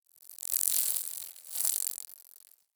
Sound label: Ext Insect Fly By; Ambience; Misc Outdoor; Warframe; Infested Eris